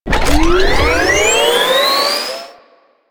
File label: Hoverboard Jump Charge; Warframe; Vehicles; Venus; anim sounds